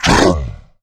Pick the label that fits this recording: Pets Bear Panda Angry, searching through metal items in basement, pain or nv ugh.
pain